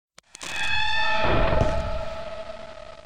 enraged scream

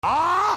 aaah

